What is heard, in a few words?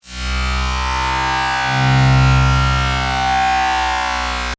Ray Phaser Flange, Scifi; Science, Fiction; Battle